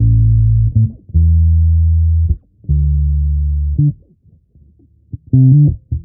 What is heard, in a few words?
bass, Loops